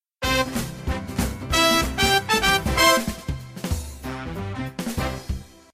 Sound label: jingle